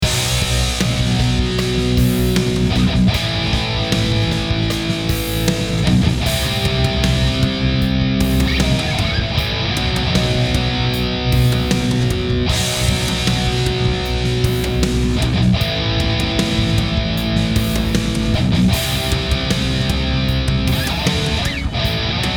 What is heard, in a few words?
drop B Dyn Bass; overdriven